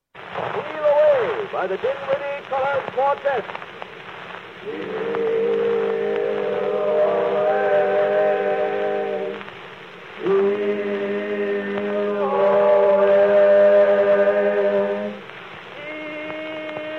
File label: Colored Quartet Steal Away